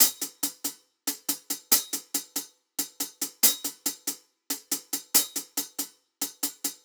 Hat Loop 140; Drums; Hi Hat Loops; Orthrus; Ghosthack; Drum Mega Bundle Demo; Drum Hero 5

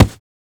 Short foley

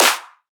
Riddim Snare Clap; One Shots; Ghosthack; Riddim Essentials 2020

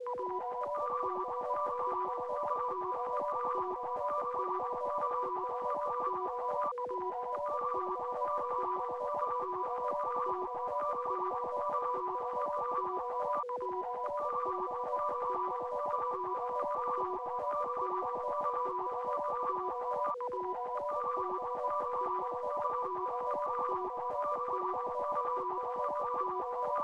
Drone Arp, 143 BPM B Min; Cymatics; 2022 Melody USB Expansion; Charge

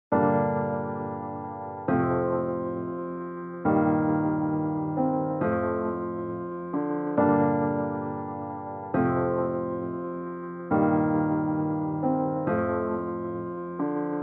136 BPM G Min Piano, Loop Stems; Cymatics; 2022 Melody USB Expansion; Cutta

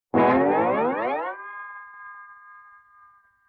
Guitar Slide Up; Vintage Cartoon; Musical Elements

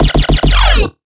Riddim Bass Hit F#; Swarm

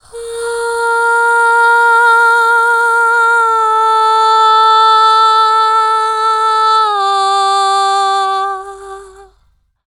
Main Vocals - Cm 70bpm; Construction Kits; Ghosthack; SkyRealm; Kit 19 - Ode To Daenerys

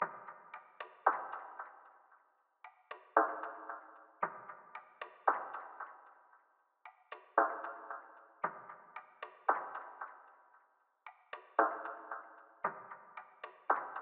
Drum Loop 114 BPM Percussion, RnB; City Lights; Cymatics; ANALOG EVOLUTION Beta

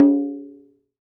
Conga, World Percussion; Cymatics; PHARAOH Beta Pack; Pharaoh Premium Drum Samples (BETA)

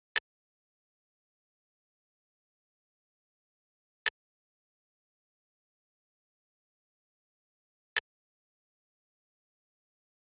Drum Loop 123 BPM Percussion, Hip Hop; Drum Loops; Loop Stems; Cymatics; Guard Drum Loop

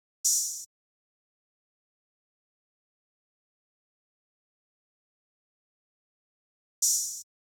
Drum Loop - 146 BPM Open Hihat; Drum Loops; Loop Stems; Cymatics; Mystery Pack Vol 4; Tighten Drum Loop

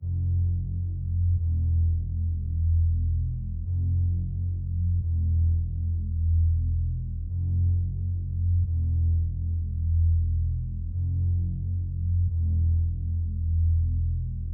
Bass, 132 BPM F Min; Life

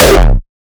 kick oneshot monster E; GhostHack

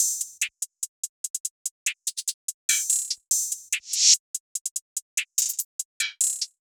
Hihat Loop 145 BPM; Smoke; Cymatics; Fiji Hihat Loops & Percussion

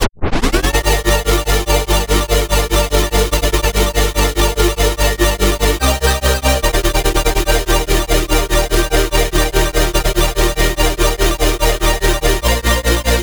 Chord Loop, 145 BPM F Maj, Synth; Future Bass; Drop Loops; Heater

